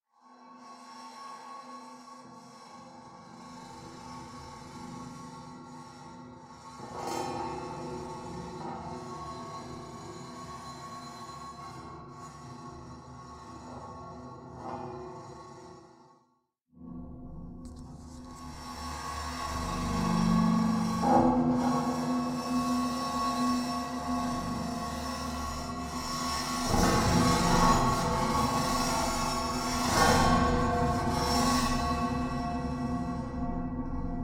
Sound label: Metal Carbon Steel Bowing Brushing ContactMic; Guild Wars 2 (Original Foley Soundtrack)